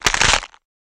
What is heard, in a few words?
Cabbage Rip Crack; gore stabs & eat